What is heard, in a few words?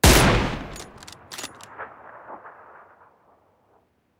sniper